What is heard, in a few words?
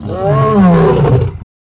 pinky die, monsters vocalizations; DoomRTX